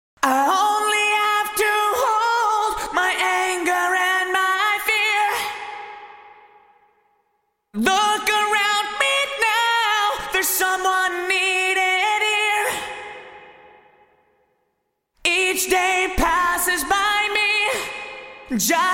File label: Vocals EMT252; Vintage Digital